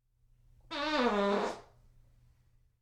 human fart

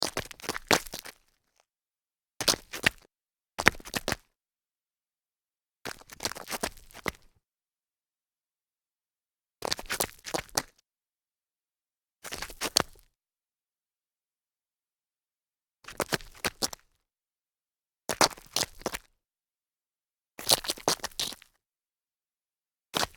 stumble on gravel, foley

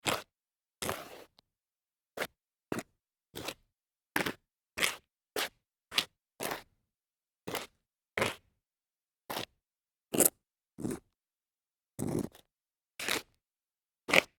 shoe scuffing on concrete

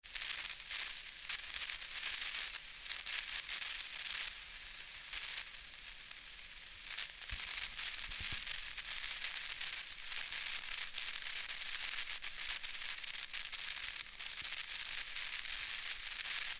tweeter electric static

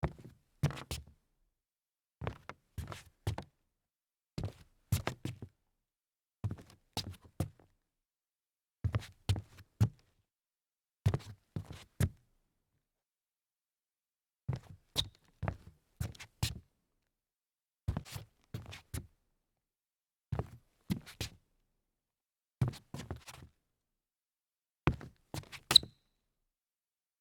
sneaker turnaround hollow wood, foley

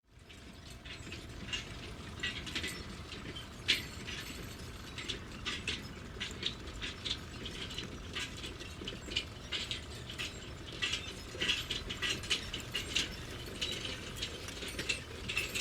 metal construction background

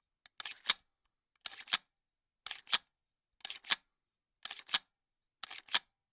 rifle charging handle

